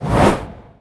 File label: meteor